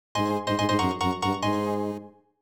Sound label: Fanfare - Item Obtained; Pokémon FireRed and LeafGreen Remastered